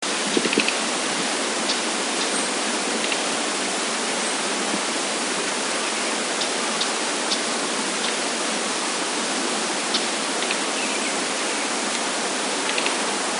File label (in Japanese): mugimaki